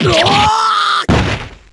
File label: super damage; kurogane